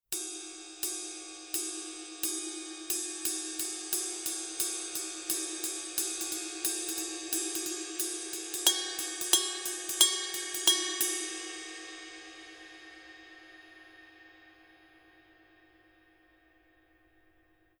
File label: 900 series 22 ride pattern; paiste